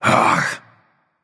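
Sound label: nonverbal ugh; smite; voicepack; merlin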